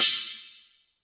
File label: Hihat